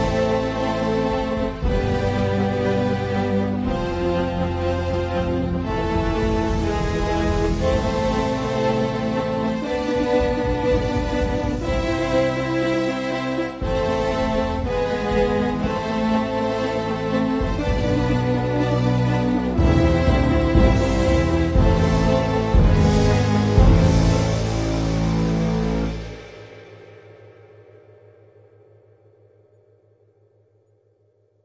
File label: sad death music